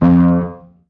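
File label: piano b octave; You Are Empty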